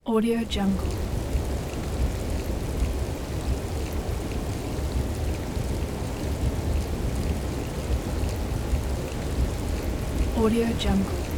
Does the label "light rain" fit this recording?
Yes